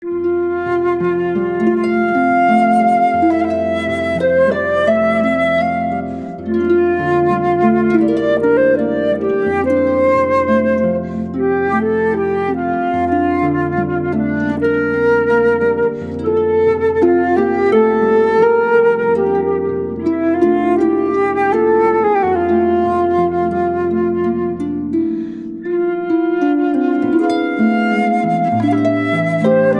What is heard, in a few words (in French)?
flute et harpe